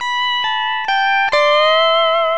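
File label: STEEL GUITAR LICK; Northstar - New Gold VOL-1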